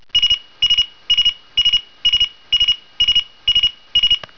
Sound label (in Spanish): TRITONO; RGB